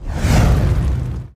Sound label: fire; hangman